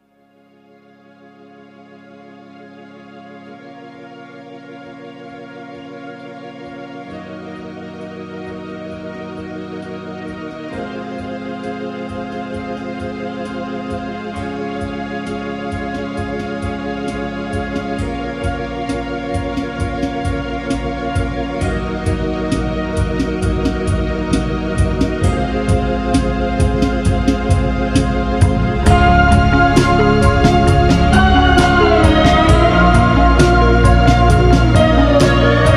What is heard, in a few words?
slowed to perfection